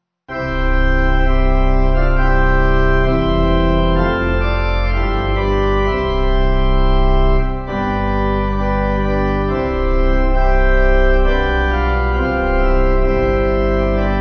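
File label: Pipe Organ, Music Backing Files for Churches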